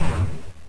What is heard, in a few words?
low crash; madcars2; radicalplay